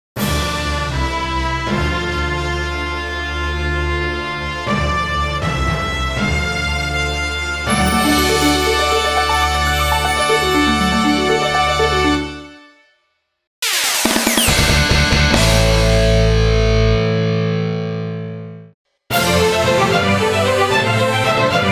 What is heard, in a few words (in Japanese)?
ジングル